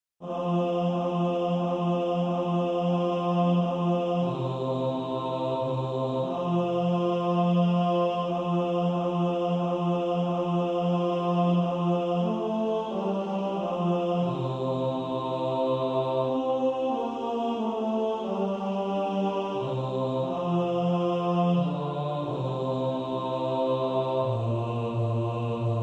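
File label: bass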